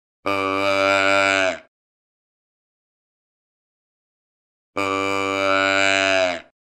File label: Deer Doe